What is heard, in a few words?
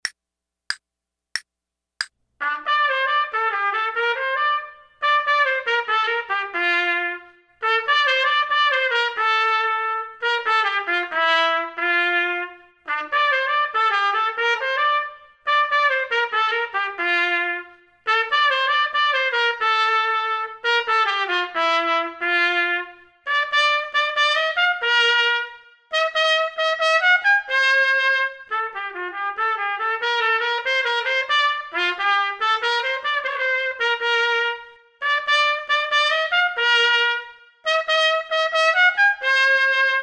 trumpet; Selected Duets for Trumpet Podcast